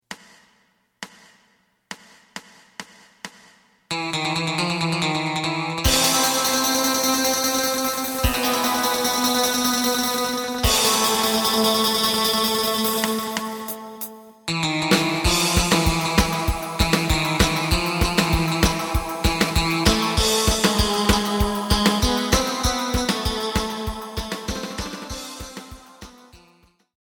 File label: bass